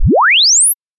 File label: sweep